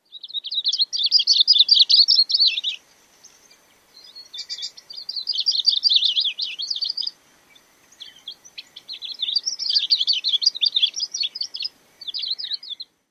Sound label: song; CA